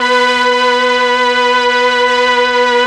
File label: STR, M400; ILIO; Propeller Island Legendary M400